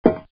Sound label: footstep metal